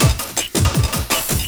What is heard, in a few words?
LOOP, 162 BPM, Drum & Bass